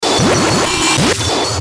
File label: Glitch Hum Fuzz Blip; Production Element Imaging Element Accent Transition; Blastwave